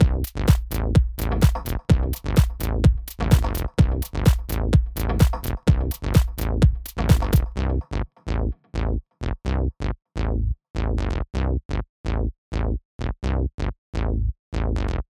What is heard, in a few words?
synth bass